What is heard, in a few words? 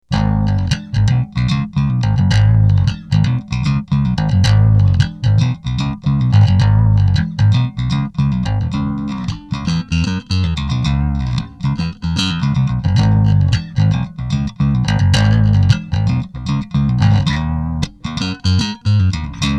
riff